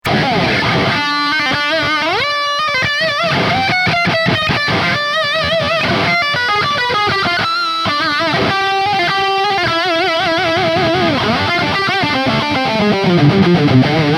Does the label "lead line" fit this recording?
Yes